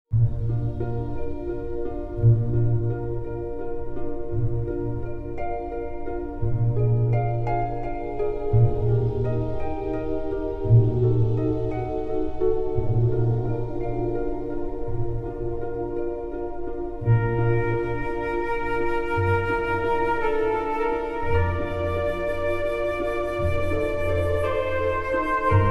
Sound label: Classical Sad Uplifting Soft; 婚礼古典的悲哀的振奋柔软